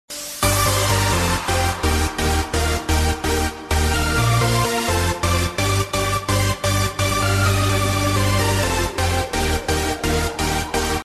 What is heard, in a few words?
smoothy trance ringtone